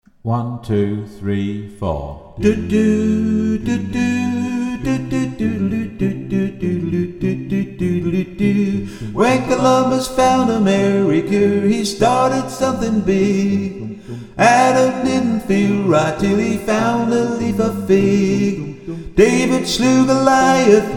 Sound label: ALTO